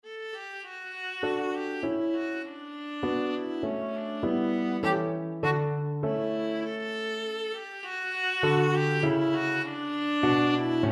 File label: waltzes, viola